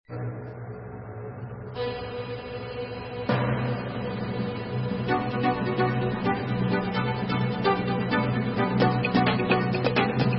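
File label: Instrumental, Israeli Dances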